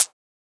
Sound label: Closed Hat; FLPRO